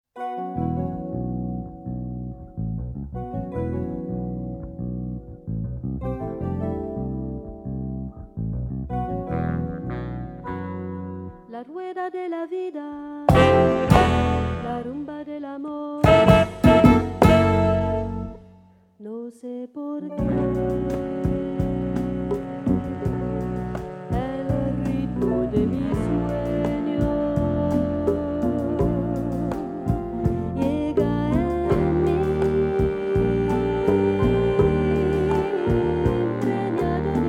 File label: rumba rueda